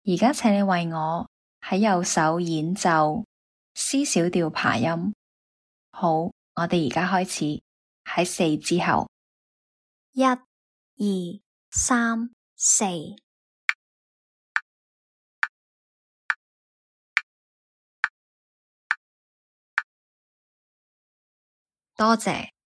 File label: c min arp rh